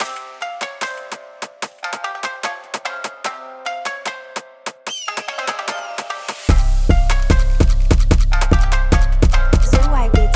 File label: Japanese jersey Drill beat Cmin BPM140